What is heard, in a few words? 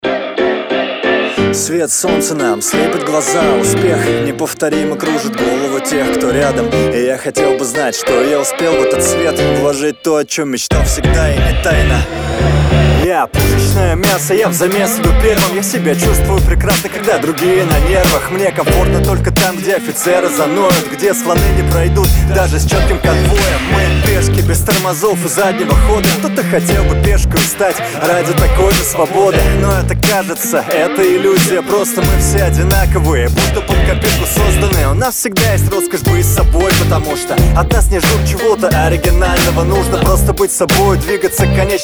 jazz